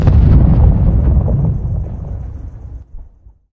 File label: boom; thereisnogame